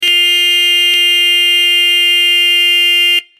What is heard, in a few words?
Hella Horns Low